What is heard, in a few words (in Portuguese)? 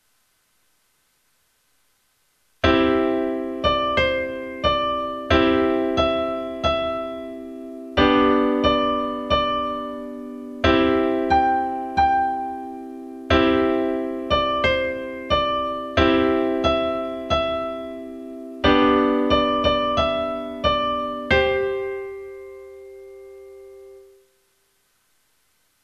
Cancao Folclorica Americana